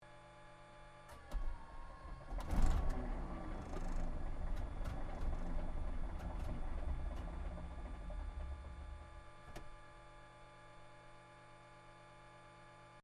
AIRCRAFT P-40 KITTYHAWK STARTUP MISS-FIRE INTERIOR STEREO; P-40 Kittyhawk / Warhawk / Tomahawk; Airplanes; Aircraft Collection